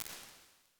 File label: drum reverb; Convolution; Reverse Reverbs; IR Samples; NI Kontakt 5